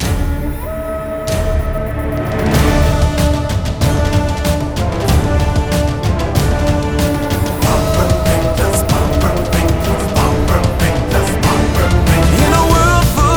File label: Theme Song Broadcast Version; Power Rangers Dino Charge